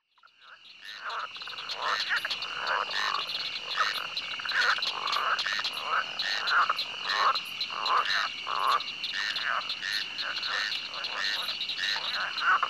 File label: frogs and insects jungle